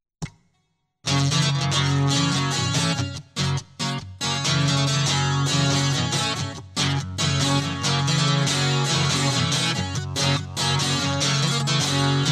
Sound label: Guitar Loop